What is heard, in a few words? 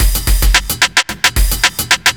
block rock kit